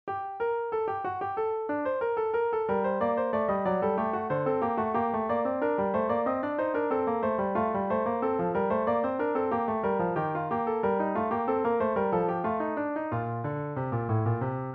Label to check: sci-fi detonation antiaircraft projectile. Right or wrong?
Wrong